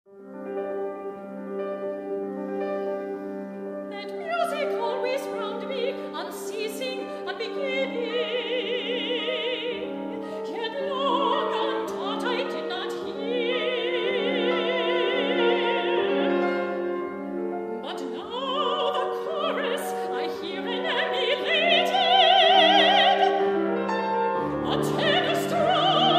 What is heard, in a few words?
Music